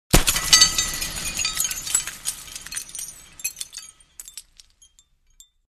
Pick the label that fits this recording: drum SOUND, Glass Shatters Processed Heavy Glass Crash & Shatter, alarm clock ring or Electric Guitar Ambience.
Glass Shatters Processed Heavy Glass Crash & Shatter